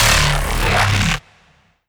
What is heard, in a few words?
shoot warning, strider; creatures